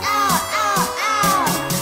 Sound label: ow ow ow